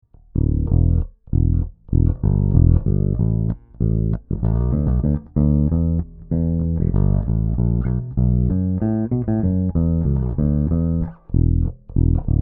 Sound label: Harley Benton BZ 5000 Neck PU passive Fingerstyle